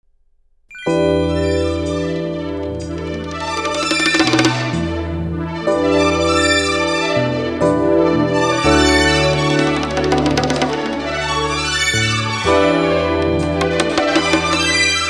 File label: instrumental